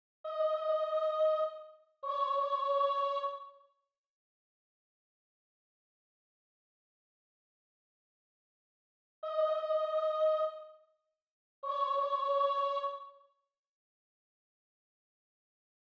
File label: soprano